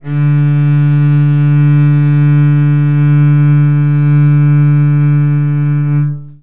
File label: e string open, cello